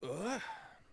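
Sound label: Swordsman Heal Vox; Civ4 warlords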